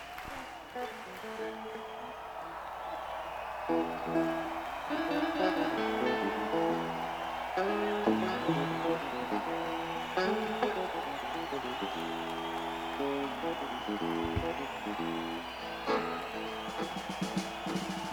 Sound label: Tuning